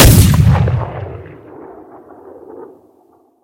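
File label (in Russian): звук вылетевшего снаряда гаубицы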